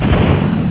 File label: explosion